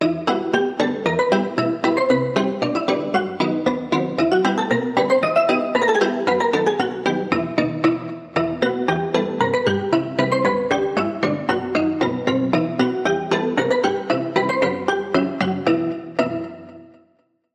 Pluck; Trick Or Treat; Routed Sound; Vital Expansion - Story Walker Vol.1

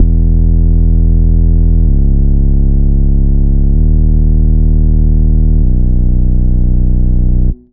Bass, Spire; Routed Sound; Spire Expansion - Star Scavenger Vol.1

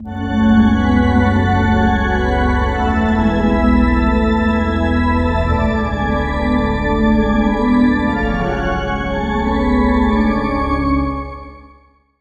Lead; Routed Sound; Serum 2 Expansion - Street Vanquisher Vol.1

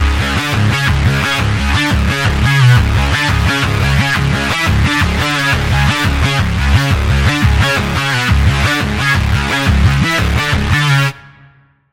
Lead, Sylenth1; Routed Sound; Sylenth1 Expansion - Quantum Enforcer Vol.1

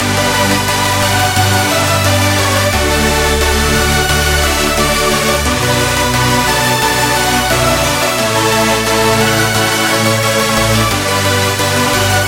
Chord

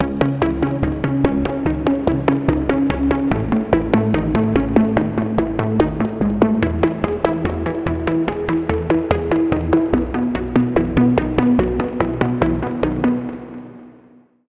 Pluck, Sub Simulation; Routed Sound; Vital Expansion - Reality Maker Vol.1

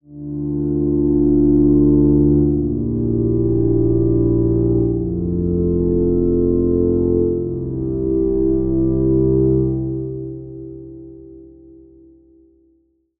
Pad; Routed Sound; Sylenth1 Expansion - Star Scavenger Vol.1

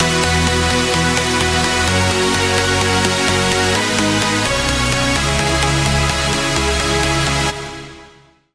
Chord; Routed Sound; Sylenth1 Expansion - Star Scavenger Vol.1

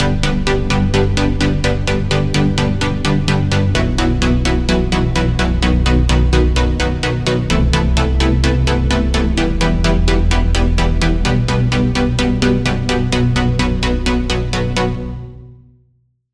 Chord; Routed Sound; Serum 2 Expansion - Star Scavenger Vol.1

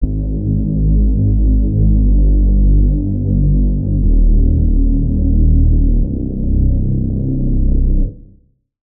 Reese - Indomitable Power; Routed Sound; Vital Expansion - Street Vanquisher Vol.1

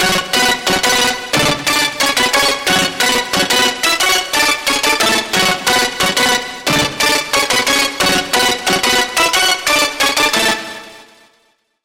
Lead; Hypercurrency; Routed Sound; Vital Expansion - Hyper Gamer Vol.1

